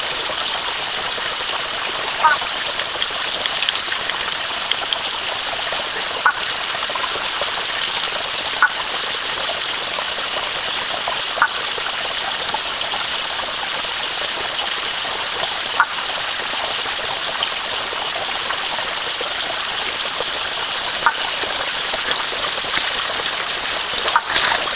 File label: Plectrohyla matudai, hylidae